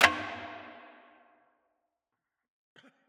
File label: cough